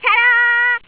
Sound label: Ta Daaah